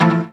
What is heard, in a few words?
Synth Sound; Vengeance Samples; VEE Synth Shots